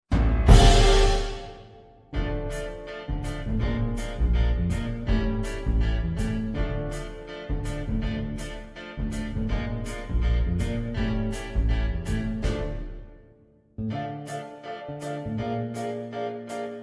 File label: instrumental